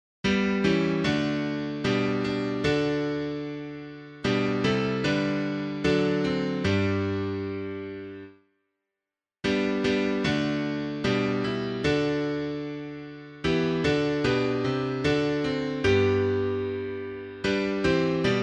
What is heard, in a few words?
piano, Hymns